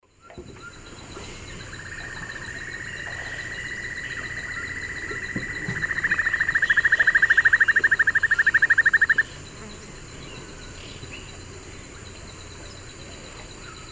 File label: Notharchus hyperrhynchus, duet; S. America; Eastern Lowlands